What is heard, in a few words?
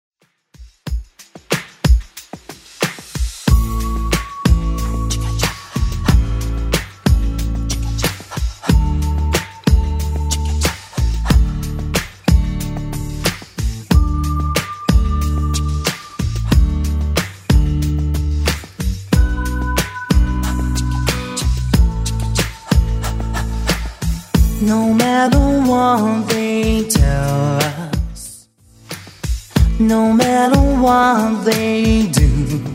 90s, 92bpm